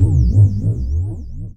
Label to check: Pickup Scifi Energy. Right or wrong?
Right